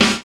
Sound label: DANCE SET, DRUMS